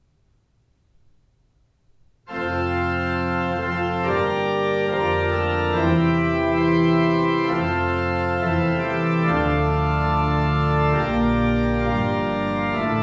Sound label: Fanfare; Det har blitt fast program å prøve min egen fanfare på alle orgler jeg prøver. Her er den fremført i Hov kirke.; Husted Orgelbyggeri Hov; ZOOM H4n